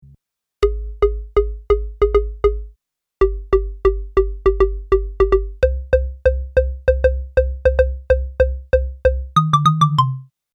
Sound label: wooden perc